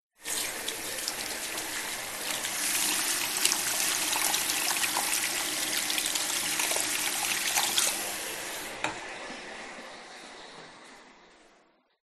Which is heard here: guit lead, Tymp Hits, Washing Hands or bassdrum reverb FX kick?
Washing Hands